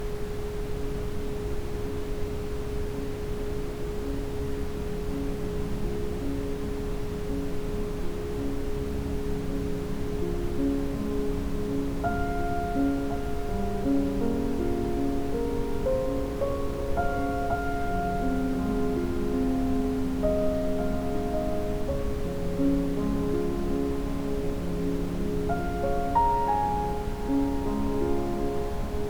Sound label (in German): mit Ambient Sound; chakren; Svadhisthana Metamorphose